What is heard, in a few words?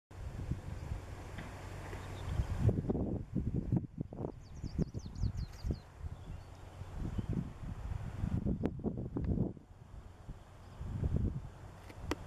Greenish SP sound